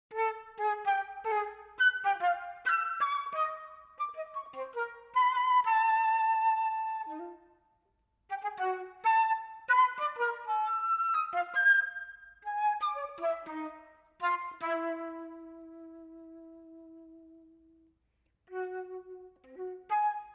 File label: contemp fl